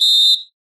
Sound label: Game Start; Minecraft